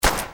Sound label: Deagle